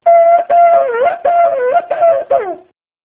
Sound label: shevarim